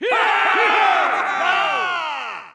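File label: cheer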